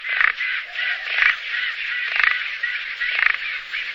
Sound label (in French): chant; cui-cui